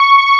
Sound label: SYST HP C#5; Analogon